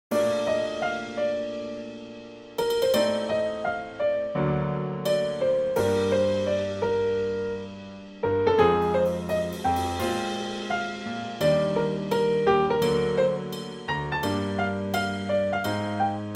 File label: start drum